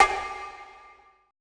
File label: CONGA L; BPC DRUMS